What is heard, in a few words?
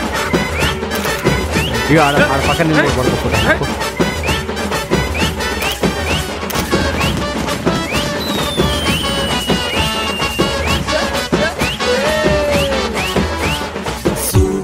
telugu ringtone